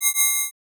Accent, Interface, UI, Alert, Clean, Metallic, Double, Fast